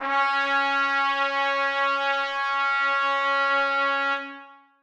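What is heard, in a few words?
trumpets sustain c#4; Sonatina Symphonic Orchestra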